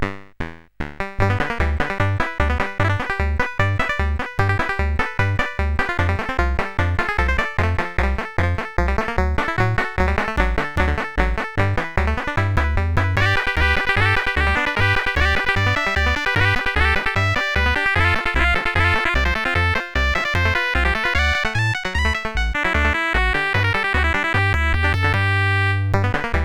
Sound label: Hillbilly High on Banjo, sid CSG8580R5